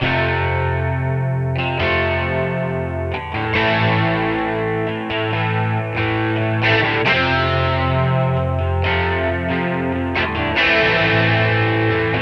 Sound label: rhythm guitar